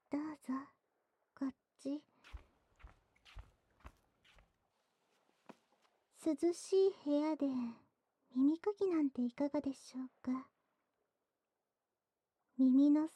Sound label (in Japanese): ふわふわ羽毛耳かきフルコース, ASMR